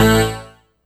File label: Synth Hit A